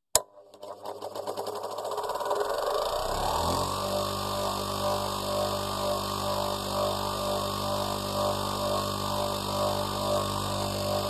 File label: Polishing machine